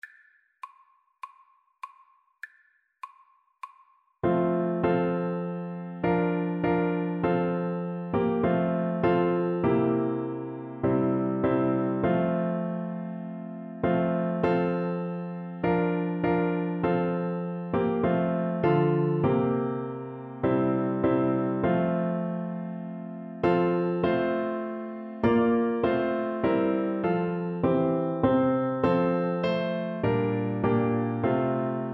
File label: my jesus, Alto Recorder